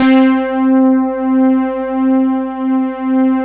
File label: PAD DIG, Synth Pads; SYN-PADs; Synth-Pads 4